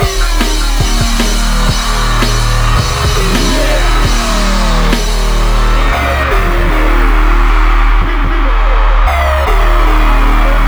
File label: Robot Live 152 Abmaj, Hardcore Breakbeat; Big Fish Audio; Hardcore Breakbeat Action